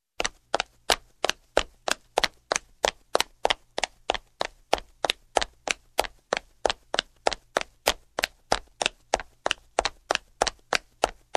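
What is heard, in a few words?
Woman running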